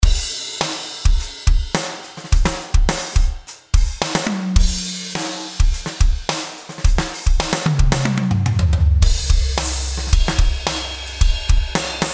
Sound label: random kit; TD-50; Studio X